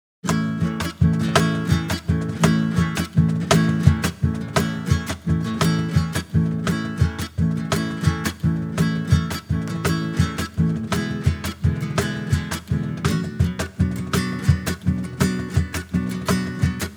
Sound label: instrumental